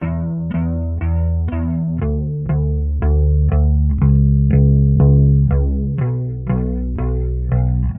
bass, Phonky loops

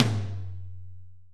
Toms Real

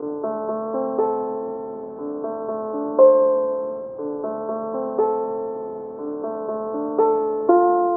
piano muted, Loops Sad

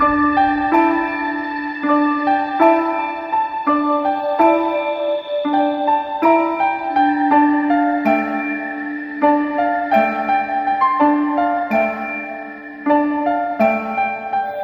piano, sad loop

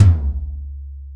warm piss, toms; Real

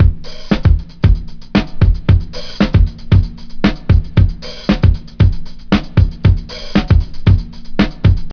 ups and downs, drum loops, funky

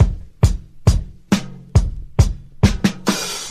the break down, drum loops

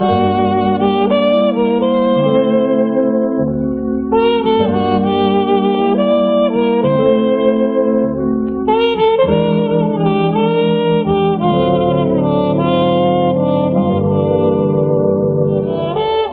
horns speakeasy

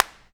Clap n Snap, Snares

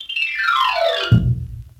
piano downslide

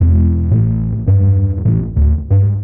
bass, Loops

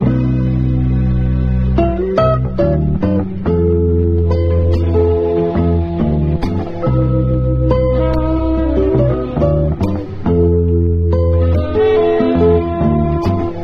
guitar restful

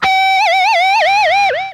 warbly guitar

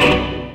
lofi spy

